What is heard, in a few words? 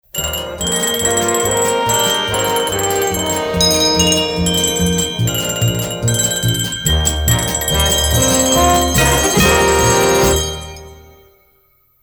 Bell Sig; PAMS; KGW